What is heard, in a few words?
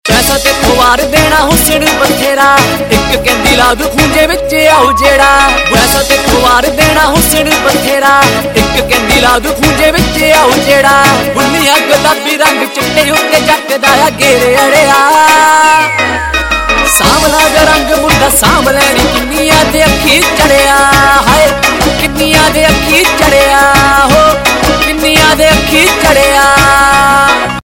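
Ringtone, Punjabi Pop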